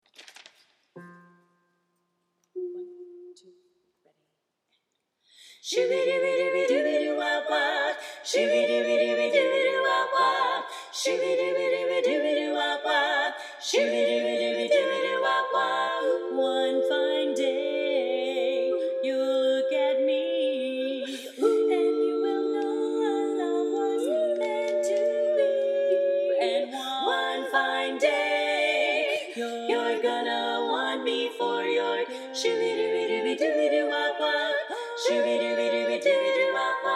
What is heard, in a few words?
TENOR; youthchorus